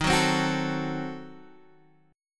D sharp Diminished 7th

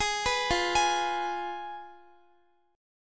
F Diminished Guitar; arpeggiated

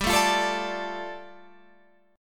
G 7th Suspended 2nd, Guitar Open F; G7sus2 strummed

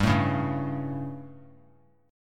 G Suspended 2nd Flat 5th G, chord

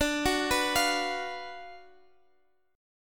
F sharp Major 7th Suspended 4th Sharp 5th D

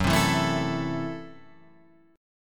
A Minor F sharp; Am/F# strummed

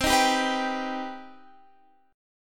C Minor Major 7th C Guitar Standard; strummed; JGuitar

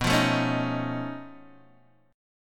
B 9th Flat 5th B down Guitar DADGAD

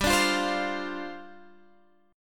G 7th Suspended 4th G; strummed; x,10,12,10,13,13

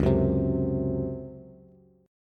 Db Major 7th Suspended 4th, Bass; x,4,4,5; strummed; chord shape

